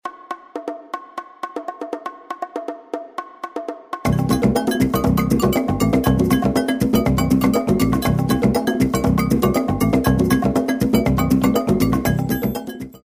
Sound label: World Groove